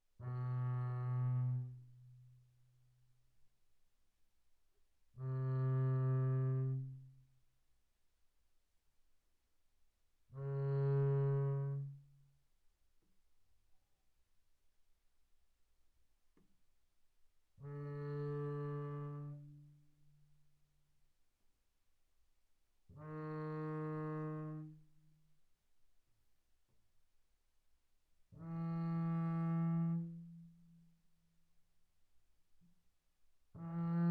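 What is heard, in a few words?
Bass arco sul A pianissimo B2 Ab3, double bass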